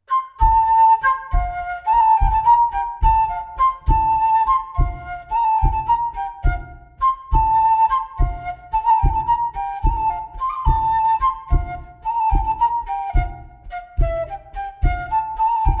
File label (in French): bourree; Coatrieux